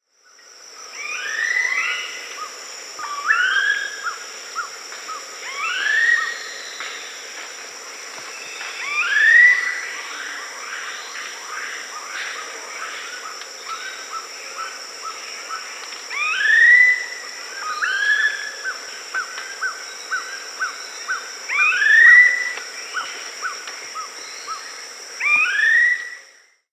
Black-faced Cotinga (Conioptilon mcilhennyi), Peru; S. America